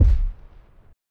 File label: Kicks